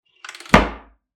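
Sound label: door slam; hlopok dveri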